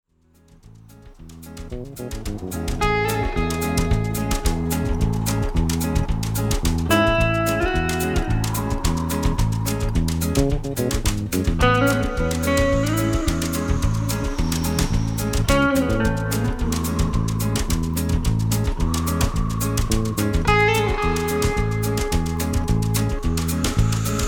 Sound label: wind (instrumental)